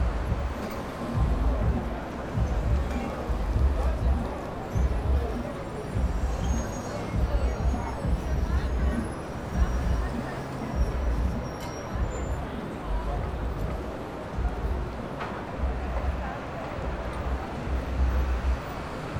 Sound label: amb; netent